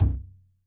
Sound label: FLOOR TOM, ELECTRO KIT